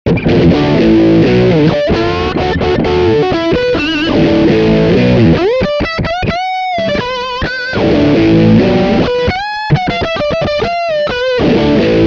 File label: FAT STRAT